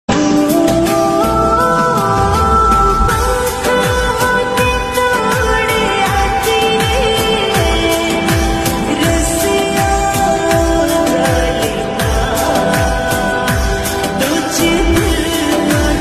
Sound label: flute ringtone, lofi ringtone